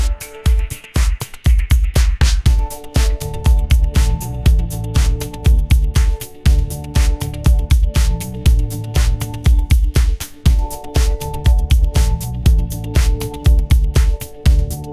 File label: pop groove